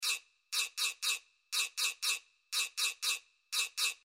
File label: guiro; reciclar tocar; guajira